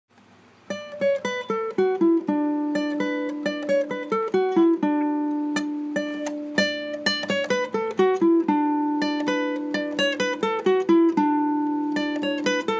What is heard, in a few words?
Noodling again